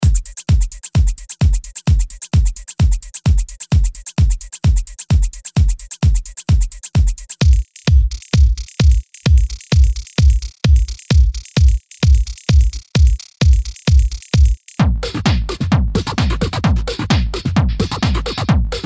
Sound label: Drumloops full